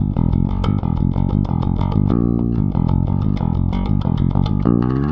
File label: Rock, Bass; Bass X